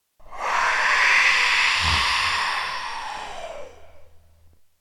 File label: nazgul type monster